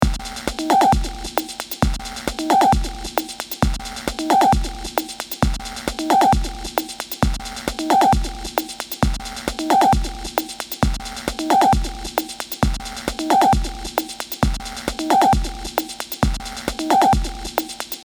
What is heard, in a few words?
Groove